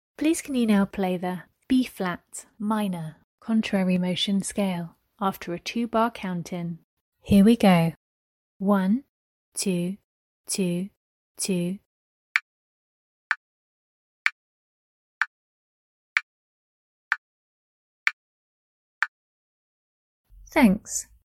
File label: b flat min contmotion scale